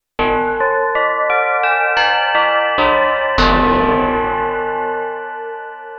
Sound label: metal bell; novation peak test